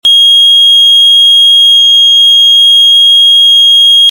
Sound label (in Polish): ton